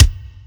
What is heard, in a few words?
soft hit whistle; osu